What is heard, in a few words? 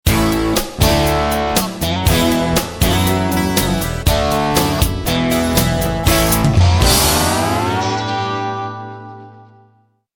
New Jingle